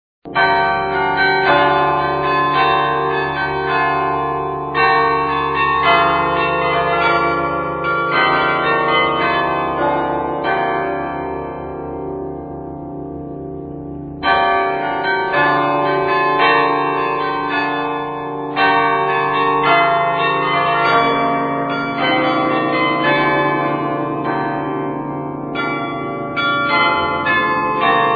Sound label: interval signal; radio netherlands